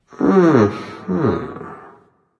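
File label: non verbal agree, voice; cernunnos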